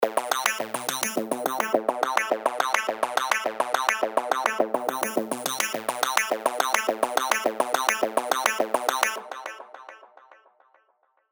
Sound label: Acid Loop A 105 BPM, Sylenth1; Broadcast System